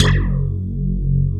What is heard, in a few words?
wet bass